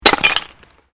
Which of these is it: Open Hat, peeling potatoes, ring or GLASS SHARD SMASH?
GLASS SHARD SMASH